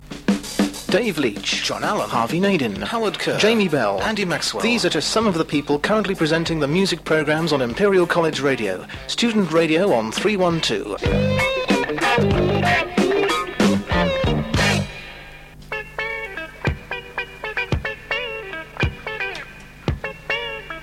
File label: jingles